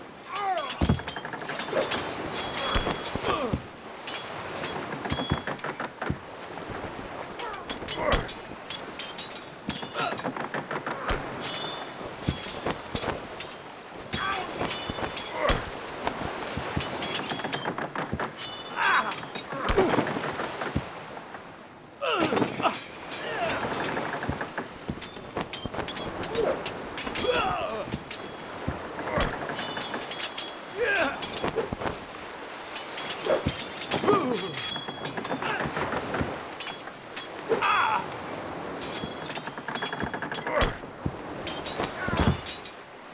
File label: ship duel bed; Sid Meiers Pirates; XBOX